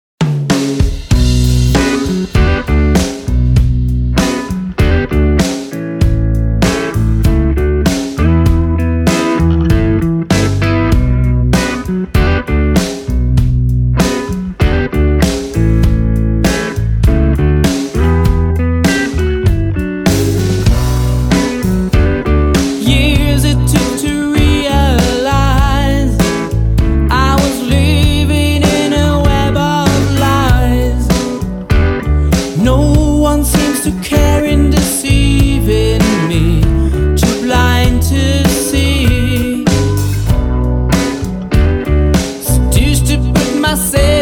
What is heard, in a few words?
strings